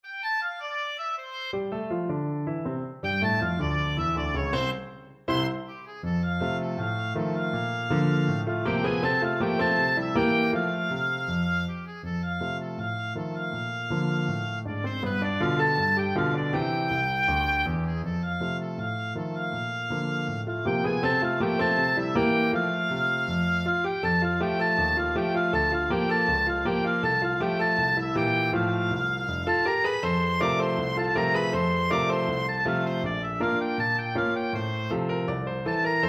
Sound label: the entertainer, oboe